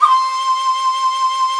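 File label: U220 shakuhachi; Greytsounds; Scott Peers Sound Engineering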